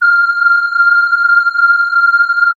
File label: lead wine F; leads